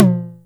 Toms, Electro

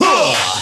"huh" scratch, voice

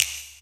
slappin; Snares

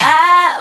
ow SUNG, Voice

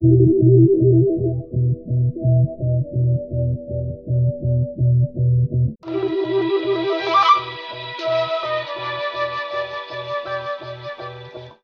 flute, Loops

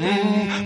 mmm male; ooh ahh

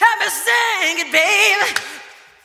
got me singing baby CLAP

